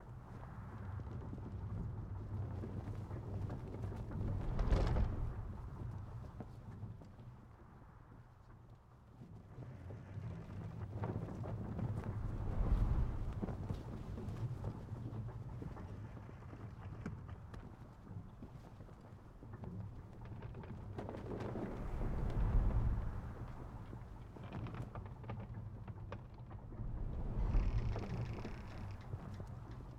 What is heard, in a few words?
dragon amb ship; tft